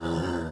Horse Fort Vox; Civ4 warlords

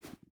footstep rubber boots sand jump start; Rust

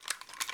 reload lift, foley; m60; ModernWarfare

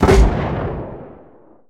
grenade launcher; cannons tanks

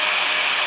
Aircrafts Canopy Common; DCS

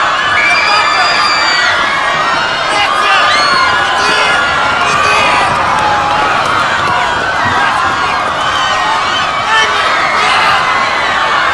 airport crowd stairs loop; Modern Warfare

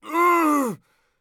death; Escape from Tarkov

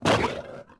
Lion Fort Vox; Civ4 beyond sword

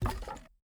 ui pickup wood; Rust

